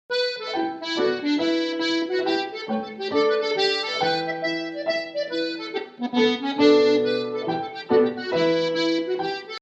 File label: Traditional Hornpipes @138